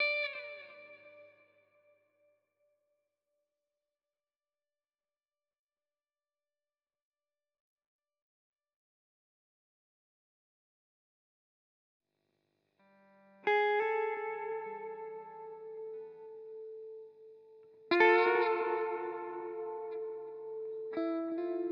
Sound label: guitar